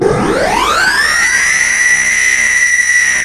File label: beam